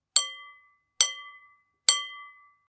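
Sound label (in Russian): звуки посуды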